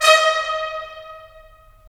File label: BRS, Roland - LA Composer; Roland LA Composer vol. 4